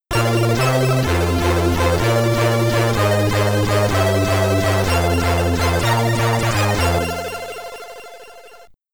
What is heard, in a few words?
Stage Clear (SID Stereo); Navy Seals; commodore 64